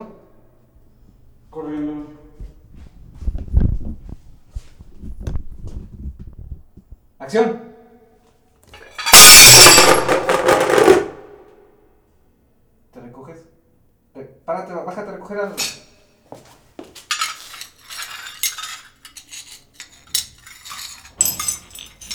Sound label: Dishes Crashing Breaking Platos Rotos